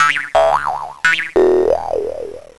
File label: BOING